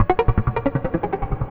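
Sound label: SYNTH LOOP, 160 BPM; Ueberschall; Drum & Bass Flash Trackz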